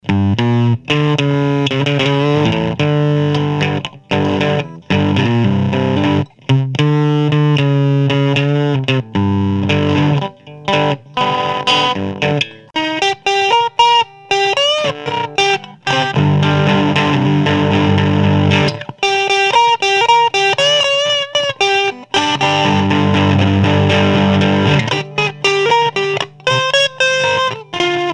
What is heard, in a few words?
amp head old amp dirty blues; dr zee se cdc amp head